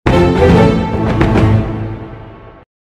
rank promotion sound; clash of panzer

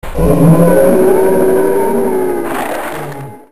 tentacle sound; half-life

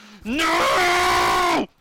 loud nuuuu

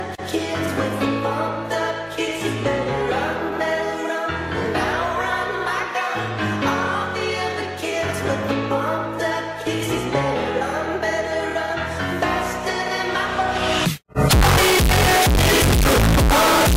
noob bass

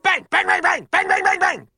bang bang bang; deadpool

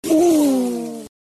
uuuhhhh

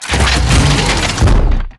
transform; bumblebee

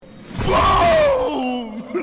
booooom

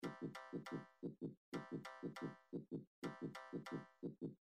low health

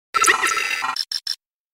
glitch

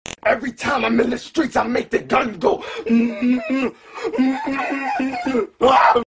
mmmm

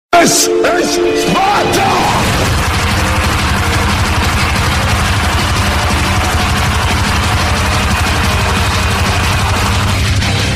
metal remix; this is sparta

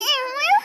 uwuwuwuwuwuwu

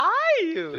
aiiih

